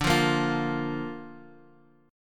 D Minor Sharp 5th D down, Bass Standard; Dm#5 strummed; JGuitar

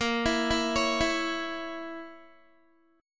A sharp Diminished Guitar, chord; A#dim; arpeggiated

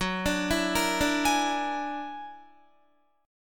Gb Augmented 9th Gb down Guitar 4E,4A,5D,5G,5C,6F; Gb+9 arpeggiated

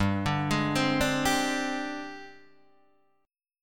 Ab Major 7th Flat 5th G

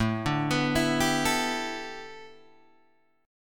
A 9th Suspended 4th, Guitar Open G; chord

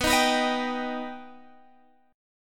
D sharp Augmented B, chord

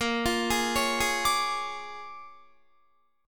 Bb 7th Sharp 9th Bb Guitar, chord; Bb7#9; arpeggiated; JGuitar

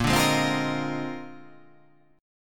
A sharp 11th

